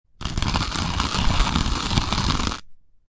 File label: Grind the coffee beans